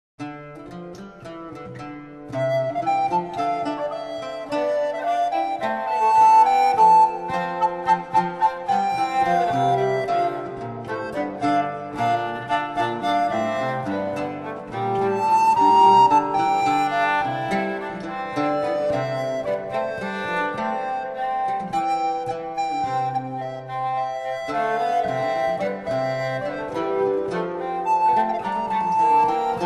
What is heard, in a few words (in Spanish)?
flauta, laud, rabel; La galatea, II